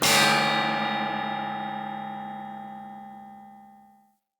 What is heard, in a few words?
Light Mirror Hit Negative; Puzzles